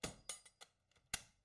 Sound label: chest latch; angband